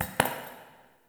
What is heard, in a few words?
Idle Single Step; Tagfer; Warframe; Ambience; Entrati